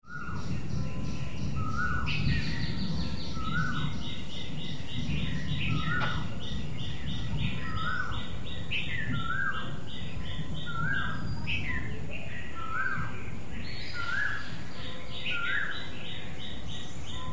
many birds

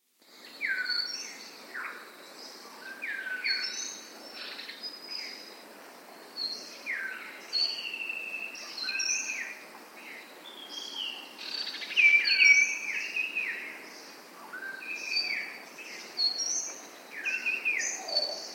Udawalawa NP, nature sounds; Animals heard in the soundscapes

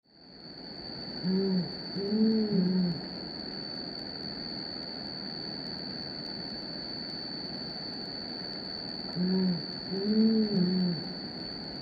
Brown Fish Owl pair duetting; Sri Lanka

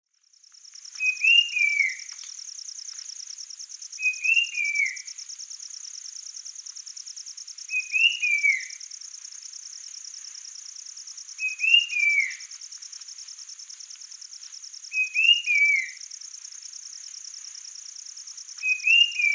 Brown capped Babbler; Sri Lanka

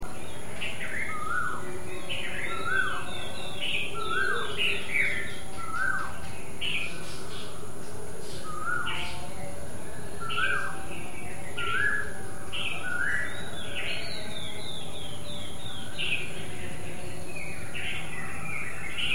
White eye babbler other

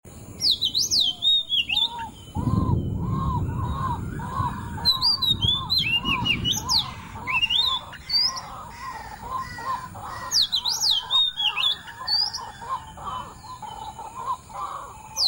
waterhen at pre dawn

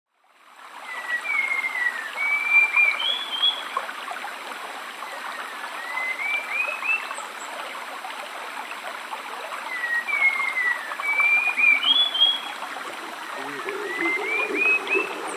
Spot winged Thrush at a rainforest stream

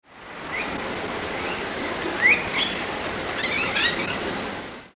Common Myna flight call; Sri Lanka

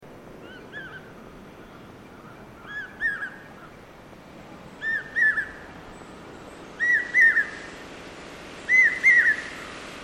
Common Hawk Cuckoo, Katukitula; Sri Lanka

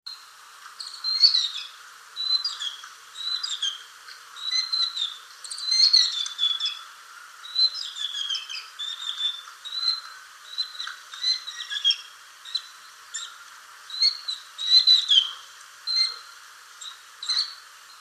Yellow billed Babbler; Sri Lanka

